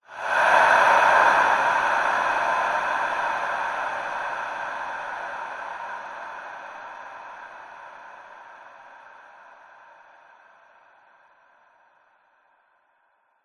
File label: horror breath sinister evil large reverb; zapsplat